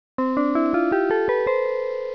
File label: piano